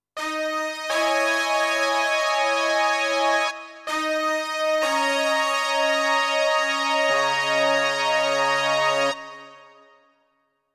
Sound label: Brass Sect 2